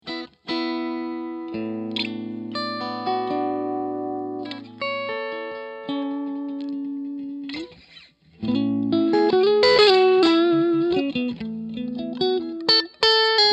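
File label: ODS Strat Clean Channel Touch Sensitivity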